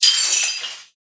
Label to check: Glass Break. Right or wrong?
Right